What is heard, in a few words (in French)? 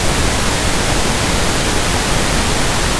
cascade